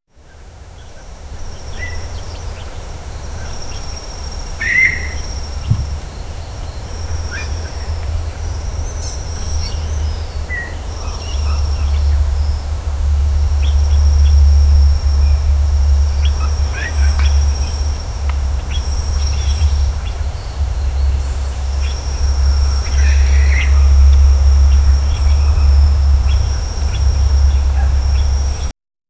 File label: misty wetland serpent